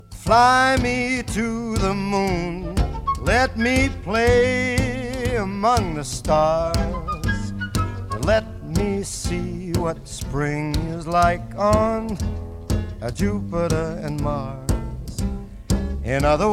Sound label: Famous Vocals